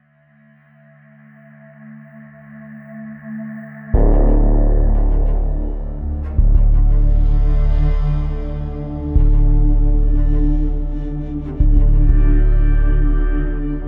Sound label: stock music